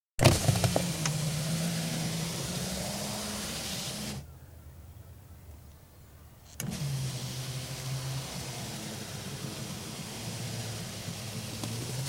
Rolls Royce Silver Spirit, interior, electrical window open & close (675 litres, 1986 model); Car Sound Effects; BBC